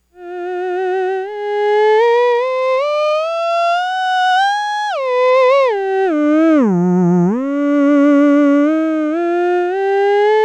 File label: ews new board, theremin; ews buffer exciter